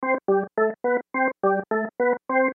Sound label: Music Organ Charge, Music Organ Charge Ascend; Chromatic; Keyboards; Music Organ Chromatic Ascend Short; Sports; Blastwave